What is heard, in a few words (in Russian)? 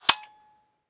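надоедающий короткий скрип старых часов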